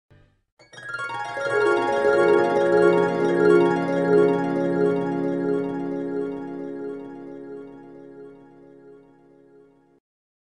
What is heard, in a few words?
Harp sound; ساز چنگ